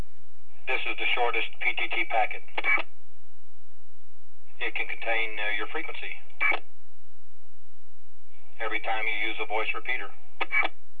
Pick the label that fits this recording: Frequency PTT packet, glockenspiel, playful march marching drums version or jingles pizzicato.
Frequency PTT packet